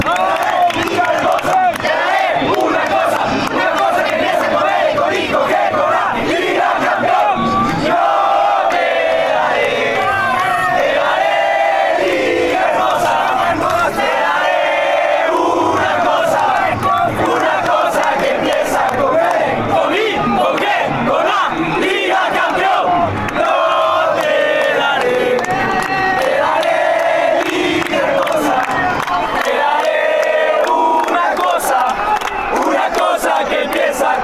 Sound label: la liga chant